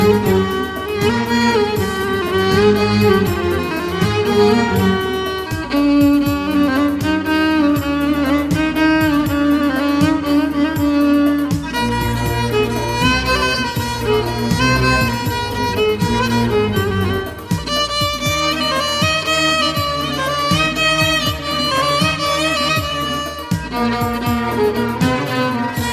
Violin

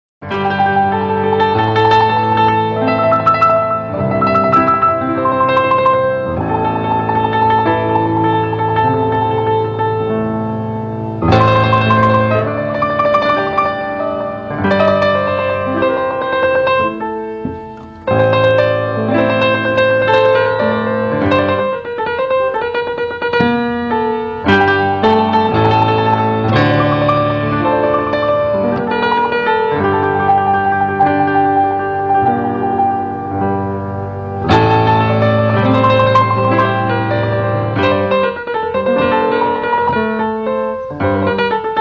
Piano

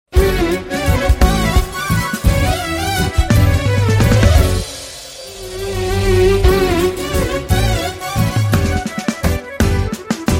Violin

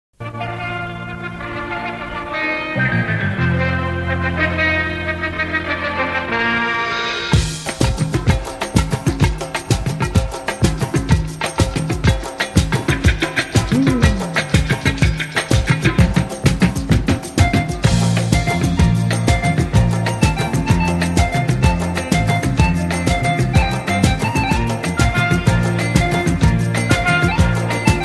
Melody

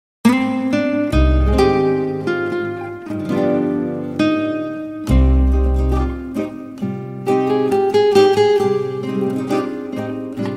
Guitar Ney

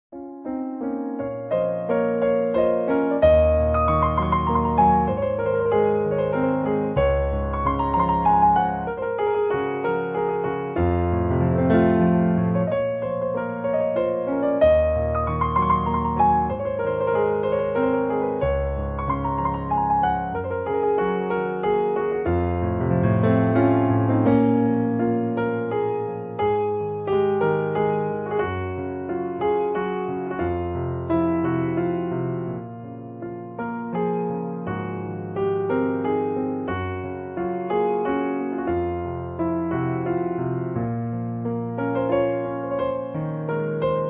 Piano

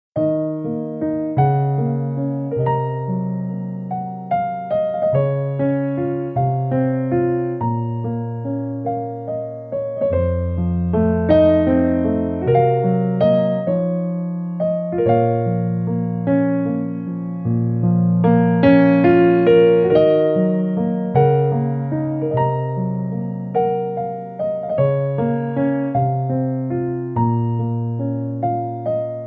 Piano

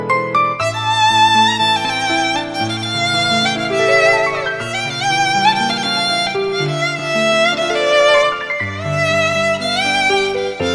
Violin

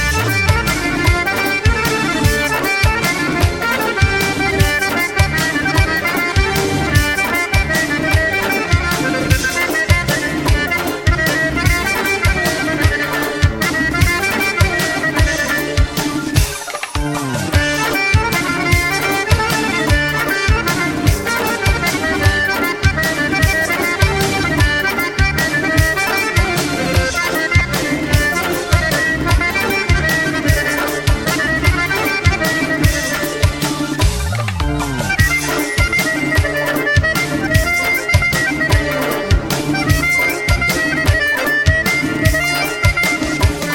Accordion

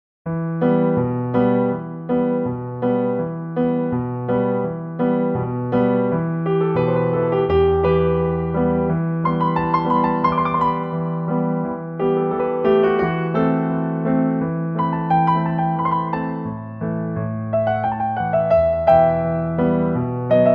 Piano

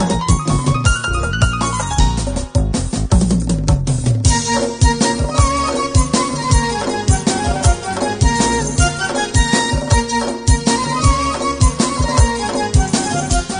Keyboard

